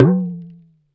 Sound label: big room tom drum sound f sharp key; tom drum samples